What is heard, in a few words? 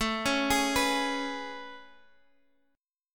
A Major 9th A, Guitar, Open G